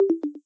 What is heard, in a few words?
disconnected; Samsung Galaxy S10